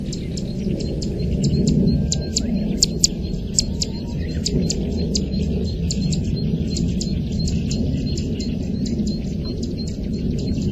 fan warbler

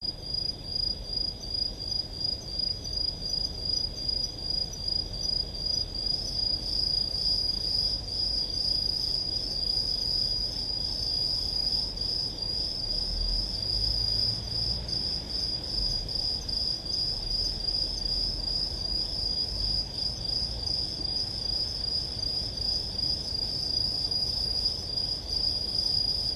aomatumusi; insect

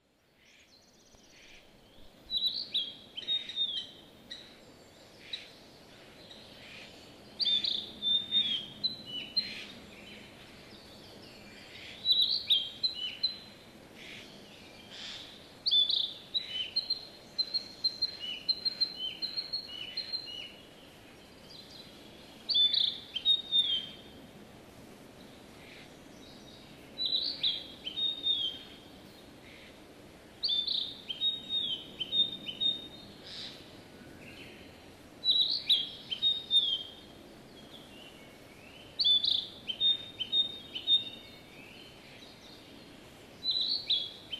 narcissus, birdlistening

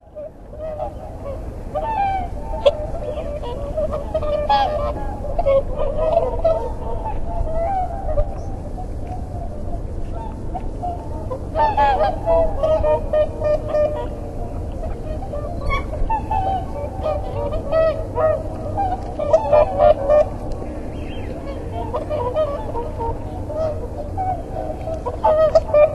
whooper swan

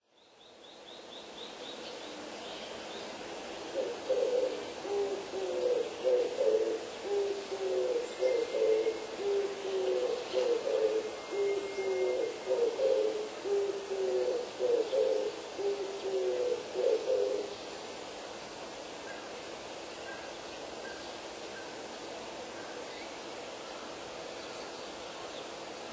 turtledove

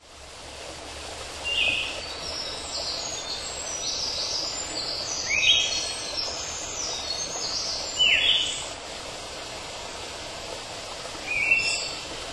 murmuring

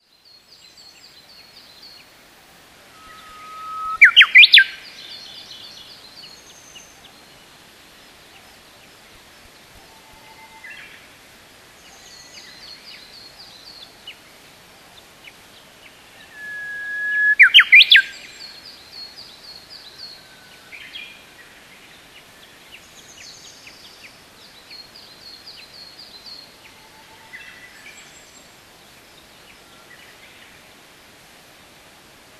bush warbler

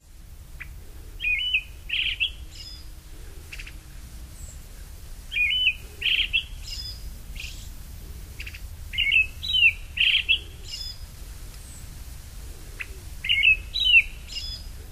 brown thrush

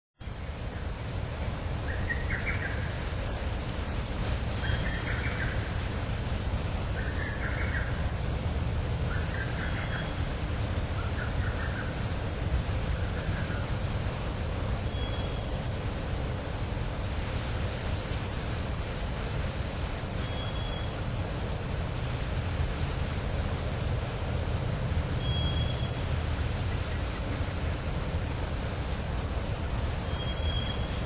ground thrush

